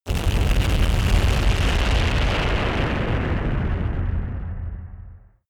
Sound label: massive blast strike